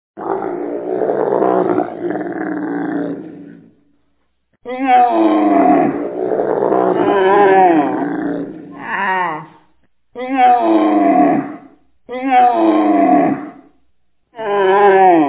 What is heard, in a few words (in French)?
cris du chameau